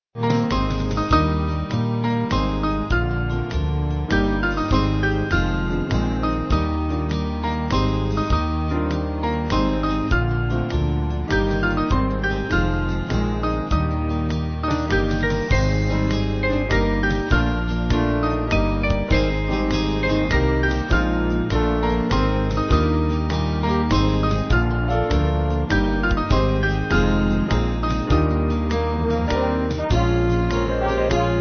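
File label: Band, Music Backing Files for Churches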